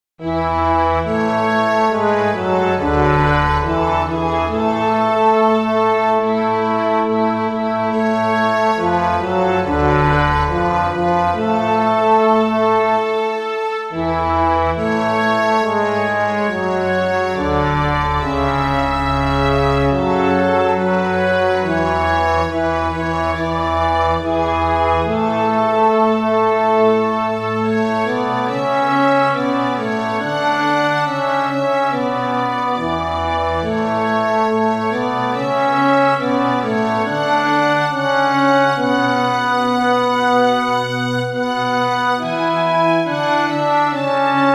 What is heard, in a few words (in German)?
Auf Adlers Fluegeln getragen Bass